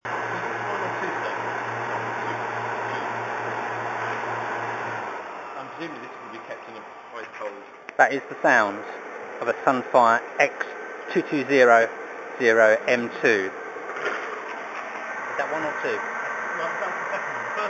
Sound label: the sound of an x2200 m2 server